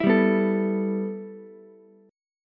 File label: G 6th Add 9th, Ukulele; G6add9 strummed; JGuitar